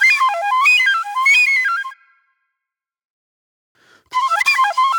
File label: Flute